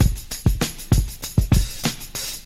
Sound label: Beat